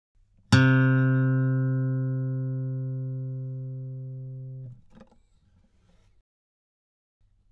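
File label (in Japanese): Steel弦 C3 on A 指, ギター; 時間逆転音; 減衰音; ギター時間軸逆転音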